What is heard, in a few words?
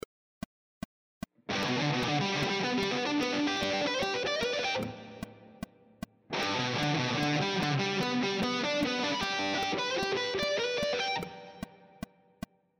triad arpeggio sequences, guitar